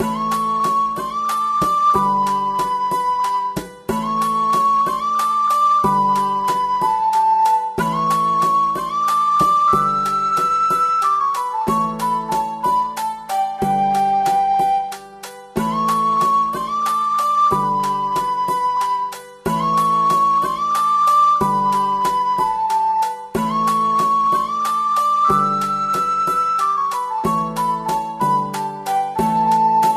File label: Day Music; Fairy Godmother Tycoon